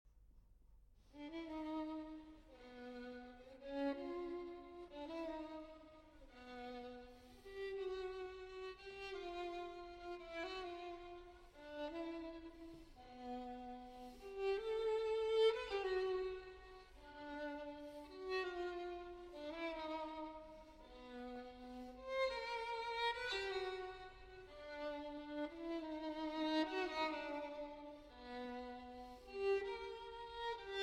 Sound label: Solo Violin; Chants du Sud, Six Short Pieces; III. 3ème Chant